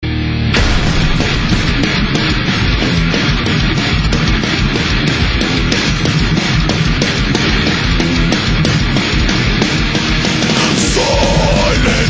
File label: silent night Death Speed Metal